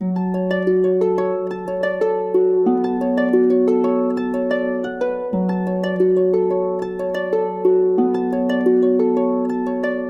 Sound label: 90 F#7-B7-F#7-B7, orchestral harp; PowerFX; Downtown Orchestra